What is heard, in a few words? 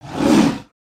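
Sabertooth Disapprove; creatures; pets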